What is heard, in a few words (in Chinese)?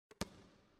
传球; 战中; nba